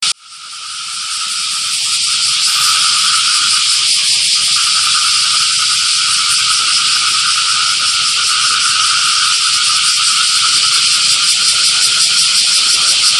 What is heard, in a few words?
rural